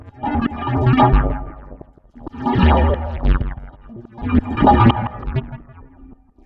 Bass Synth & Delay, contact mic, Tin Of Guitar Strings; musicradar; contact mic madness